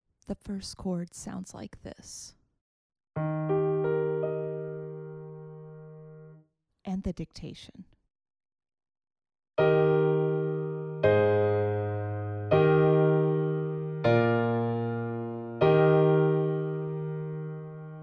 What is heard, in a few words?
harmonic dictation